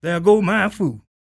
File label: SING, Blues Soul; Spectrasonics Vocal Planet